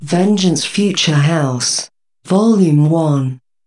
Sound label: Intro 130BPM Vocals